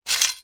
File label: medieval sabre draw from sheath fast; still north media